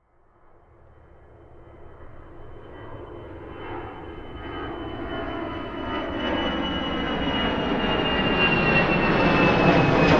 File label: transportation aircraft commercial jet pass low external, Aircraft (Large Jet) Fly Low Overhead; Aircraft - Large Jet Airliner Fly Overhead Very Low; Airplanes; Vehicles; McKinney Sound